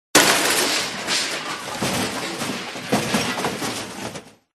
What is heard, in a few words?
crashes metal; Audio Mangler